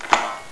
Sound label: unlock church